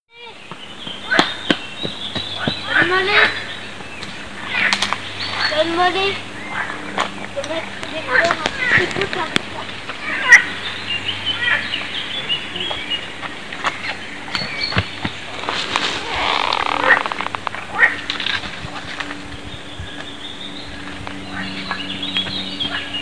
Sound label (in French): krenouilles